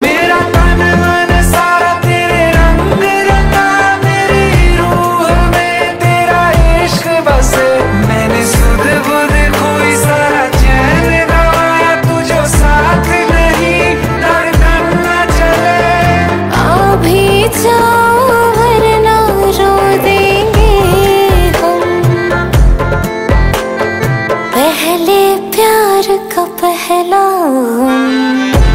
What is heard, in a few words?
Duet Male Female Version; Hindi